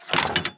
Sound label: Ball Release